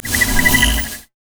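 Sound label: concussion grenade; LoL; heimerdinger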